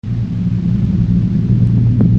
Earthquake Tremor

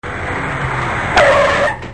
Car Skid; Machines

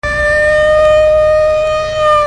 Police Siren; Machines

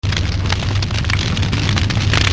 Camp Fire

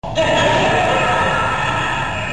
Laugh

